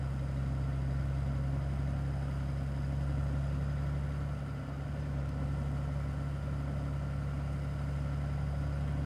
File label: Car Moving Slow